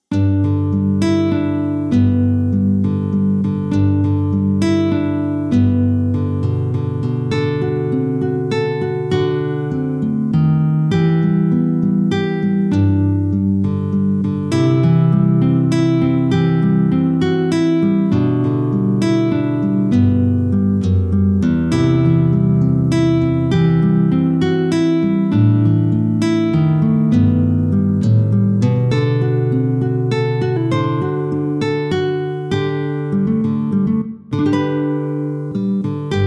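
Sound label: Silent Night Hard; Slient Night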